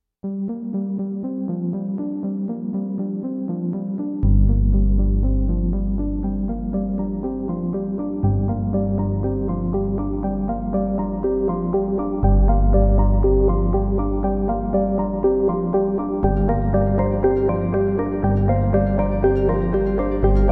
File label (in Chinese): 宣传片背景音乐